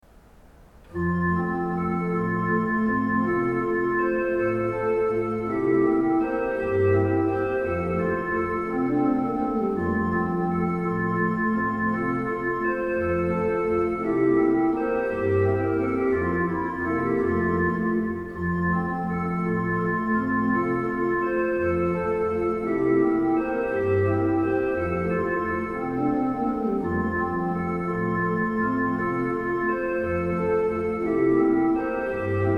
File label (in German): abendglocken